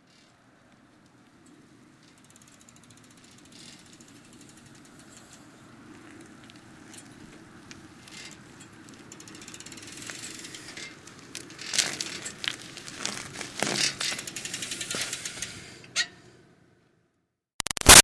Bicycle Retro Cruiser Up Stop Crawl Wobbly; Bicycles; Vehicles; BigRoomSound

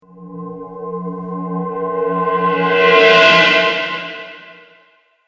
Cymbal Resonant Whoosh

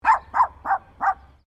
Animal Dog Poodle Miniature Bark Sequence; Various domestic dogs; Dogs (Sound Effects:Animals)

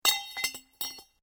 METAL PIPE FALL; Sound Effects:Materials; Foundation3

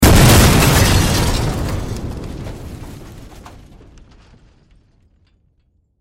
Explosion Glass Debris; Military; Warfare; Blastwave

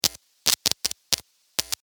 sparks, Electric Spark; Several Sparks , From Guitar Cable

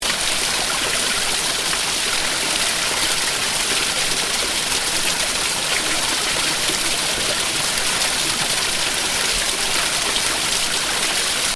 Stream loop, Stream: water running, loop; Nature; Stuart Duffield

